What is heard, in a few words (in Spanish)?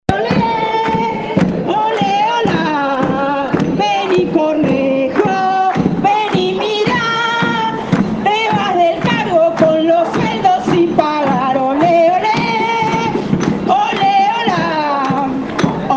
AMBIENTE MARCHA